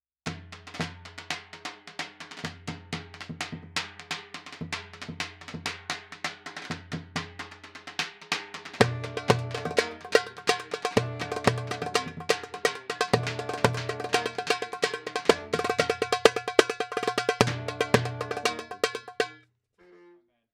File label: dumbek